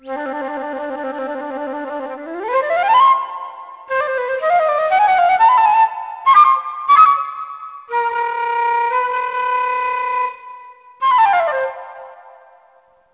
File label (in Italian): flauto